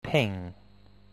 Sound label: ping